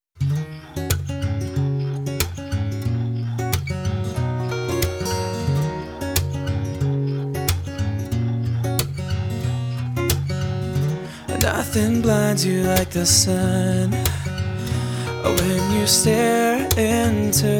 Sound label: All Night Train, Acoustic Version, Folk; Summer Folk 2024